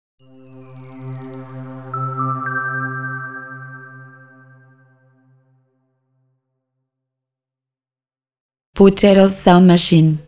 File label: tech logo